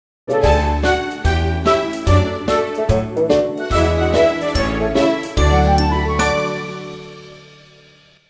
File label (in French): Enfant histoire link; Jingles medley